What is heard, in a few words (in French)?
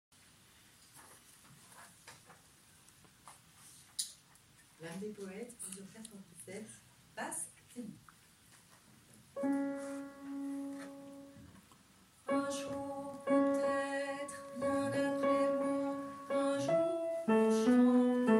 hommes